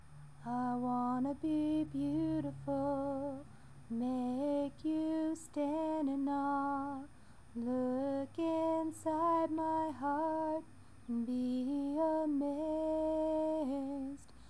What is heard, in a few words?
sings